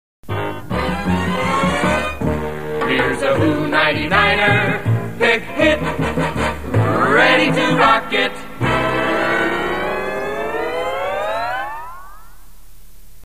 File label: jingle; 99er Pick Hit